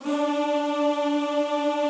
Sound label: D3-L, Classical Choir; Best Service; Extended Classical Choir